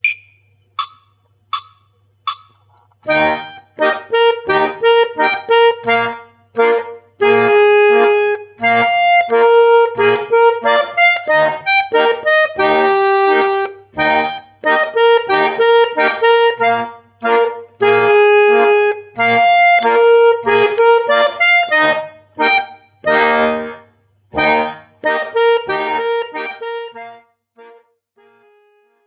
polka

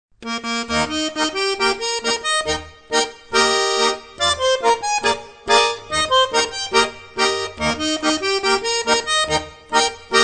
polka

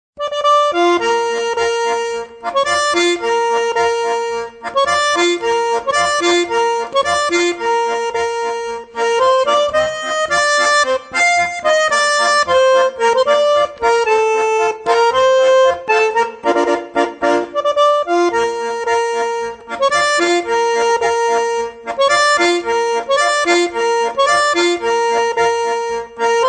marsch

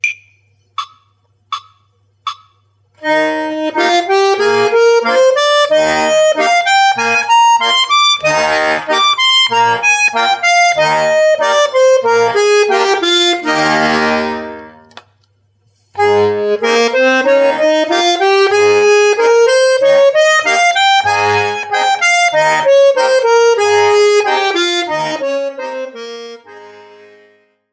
es as und des dur tonleiter

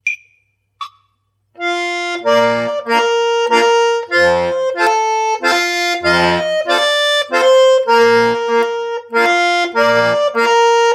volkslied; doet aene am baergli

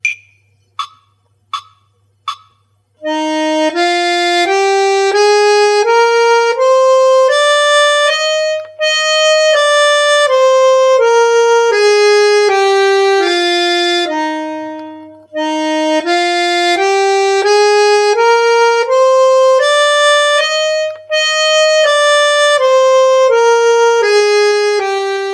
kleine einspieluebung

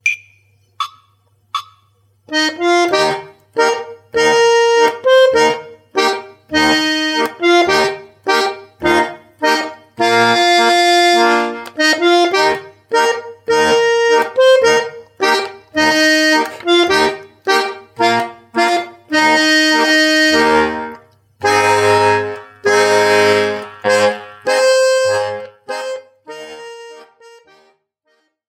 oh susanna amerikanisches volkslied

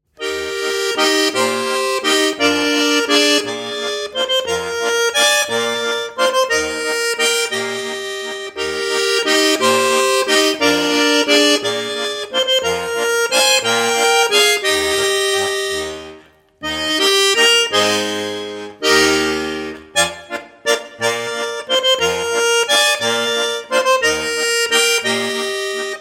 Walzer

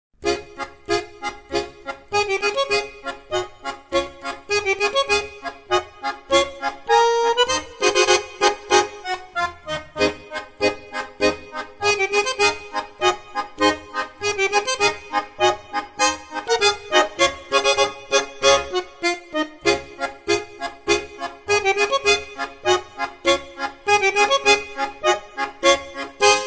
marsch

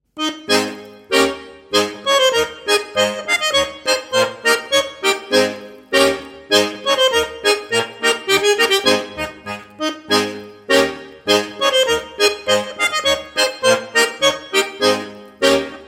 zuercher sechselaeuten marsch

